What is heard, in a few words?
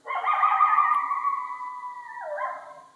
coyote